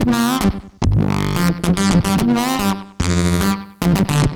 Jen Pulses B 110 G, modular 110bpm

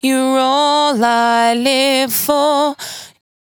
92bpm Bb, Velvet Vocal; Zero-G

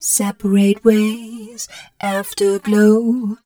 f mel c, fem Vocals

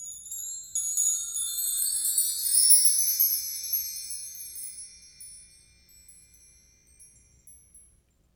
PRC MARKT, Orch Kit; Roland LCDP03 Orchestral Perc; Orch Combo 1

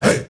HEY HEY

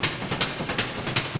Bite Size Beats; FM 57 - The Ultimate Sample Collection (1997); Essential beats

